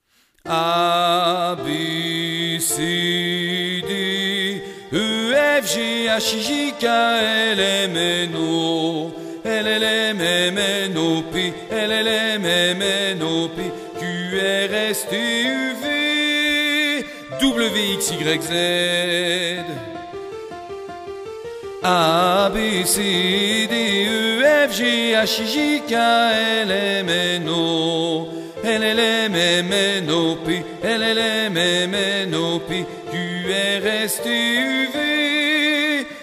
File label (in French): alphabet alti